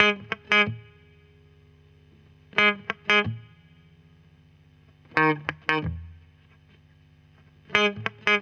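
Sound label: guitar, Roots Funk; USB Soundscan vol.41; AKAI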